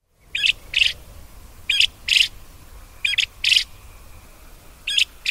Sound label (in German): japanwachtel; haus und nutztiere erkennen